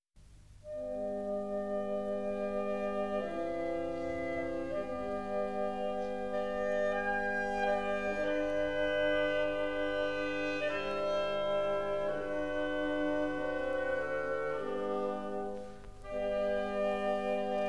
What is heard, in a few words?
Cello Concerto No. 2; Dvorak; Legend of Galactic Heroes; Free Planets Alliance SIDE